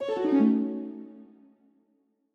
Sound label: Failure Jingle